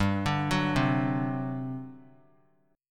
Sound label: G Major 7th Suspended 4th, G, down; GM7sus4 arpeggiated